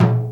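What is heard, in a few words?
Japanese PERCussion; USB Soundscan vol.60; Asian Traditions; AKAI; JAPAN PRC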